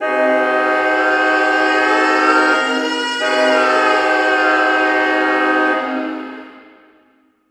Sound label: Hockey Goal, Hockey Horns & Buzzers; SFX Kit; NewYork